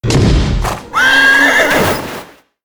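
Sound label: boss spawn; Kenta; thedead3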